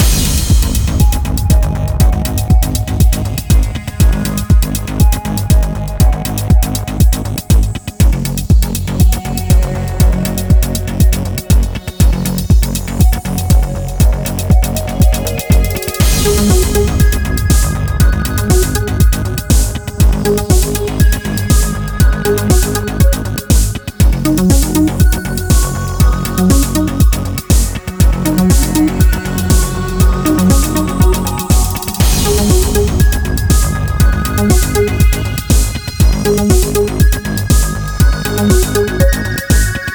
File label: Nova - Loop